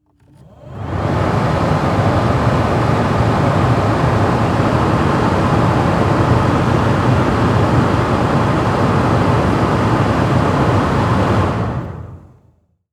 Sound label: Transportation Cars Honda Civic Run Heater High